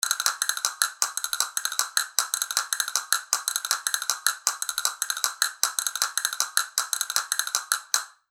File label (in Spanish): castañuelas capricho granadillo